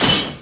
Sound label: maximize power sword